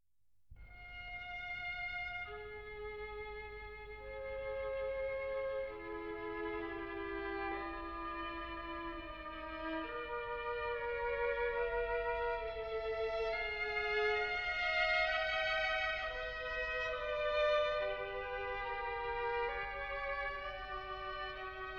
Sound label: Quartet A Minor, String Quartets; Béla Bartók